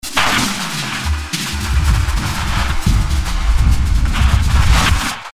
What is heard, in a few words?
Drumsus